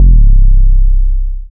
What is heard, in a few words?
808 c slide; boom bap modern